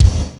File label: BD LONGATE, bass drum; BASSDRUMS